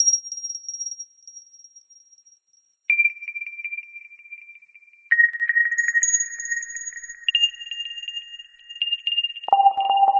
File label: Drm loop